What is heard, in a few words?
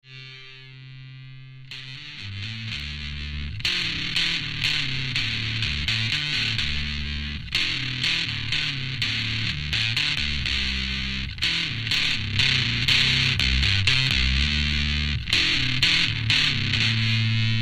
Ensemble Fuzz; Melodiya